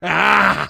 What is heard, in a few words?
monk pain; Source Engine; video game; ravenholm